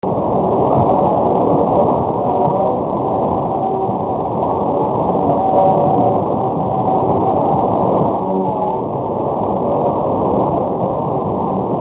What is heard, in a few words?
waves